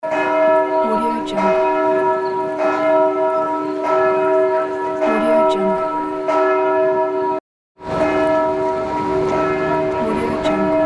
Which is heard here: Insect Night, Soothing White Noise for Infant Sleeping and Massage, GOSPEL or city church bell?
city church bell